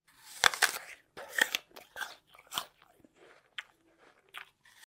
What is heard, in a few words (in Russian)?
yabloko